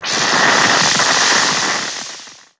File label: electricity